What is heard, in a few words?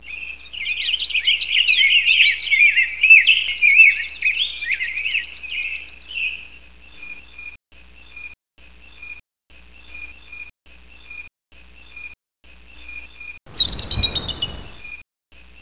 bird best